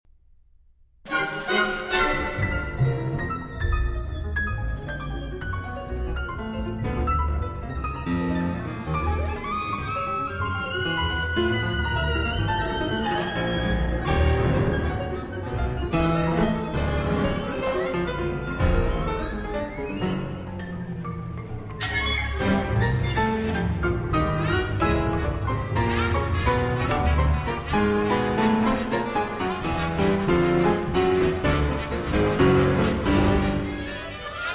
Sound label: Concerto For Piano and Orchestra, Live at Symphony Hall; Symphony Hall, Boston 2019